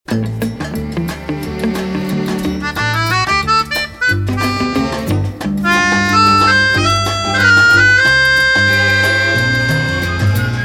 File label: Bossa Nove Inst; PAMS; KOMA